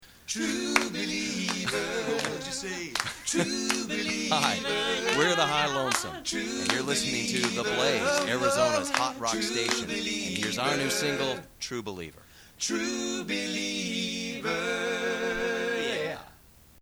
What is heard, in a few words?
doo wop